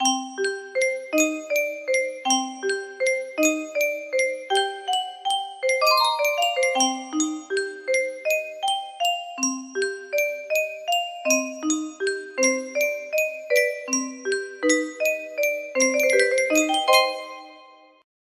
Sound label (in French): yunsheng boite a musique, music box melody